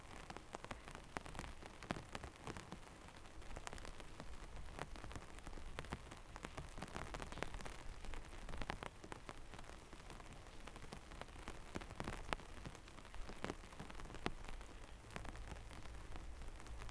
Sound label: rain umbrella; 99Sounds; Rain And Thunder